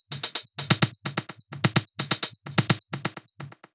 House loops 128BPM; Vengeance Samples

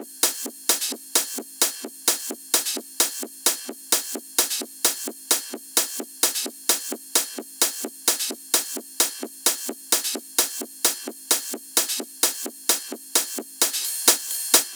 130BPM Kit; Vengeance Samples; Pressure 2 Kit

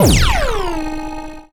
FX Downlifter

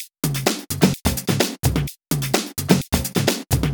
Minimal Loops

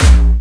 Bassdrums Dirty, Kicks; Vengeance Samples

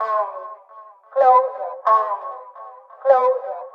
House Loops, Vocal Loops House 128BPM

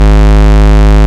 Ultimate Bass G# Root G#; Vengeance Samples

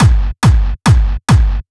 Kick, 140 BPM; Rap; U Got Me Rocking

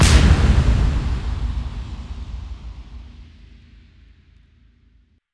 Bassdrums FX, FX Kicks; Vengeance Samples

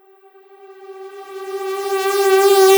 Tonal FX G

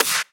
Clap; Vengeance Samples

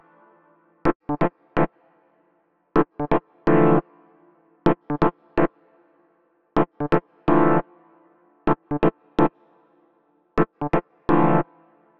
Root D 126 BPM; Vengeance Samples; VEH3 Special Sounds

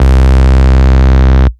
Ultimate Bass Root C; Vengeance Samples

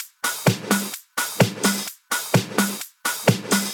House Loop, 128 BPM; Vengeance Samples

